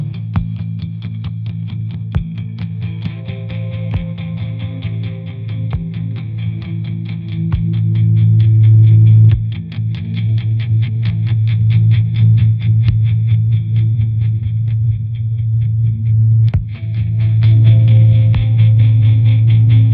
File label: ringtone pop